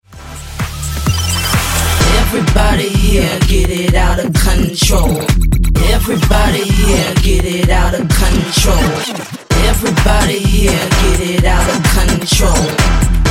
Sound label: EDM Bootleg